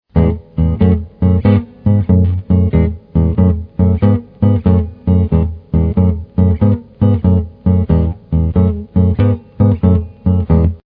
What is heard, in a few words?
blues guitar